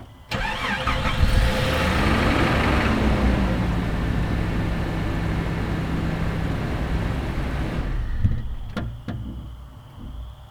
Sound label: start car